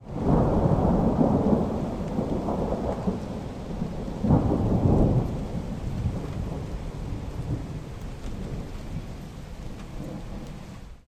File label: Thunder